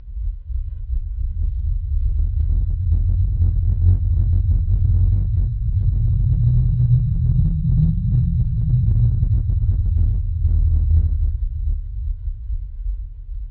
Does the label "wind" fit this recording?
Yes